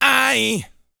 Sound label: GRUNT, MALE HUMAN; Spectrasonics Vocal Planet